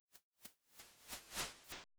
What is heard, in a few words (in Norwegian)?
Skratsj